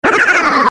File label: attack; freak fortress 2; luigi creature